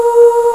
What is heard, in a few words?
OOH HI, CHORAL; FAIRLIGHT CMI IIX